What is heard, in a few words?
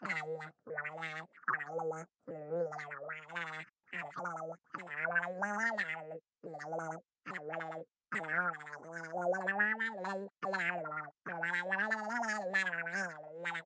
Voice Buddy Sing; Shiokara